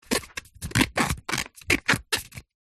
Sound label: food pear handling squeaks